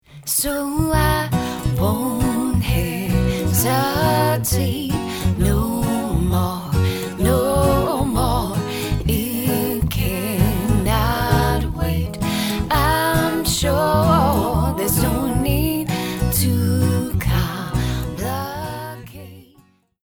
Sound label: ACOUSTIC MULTI